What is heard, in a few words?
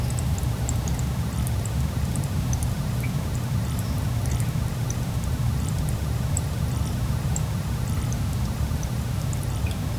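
outdoor post rain ambience, daytime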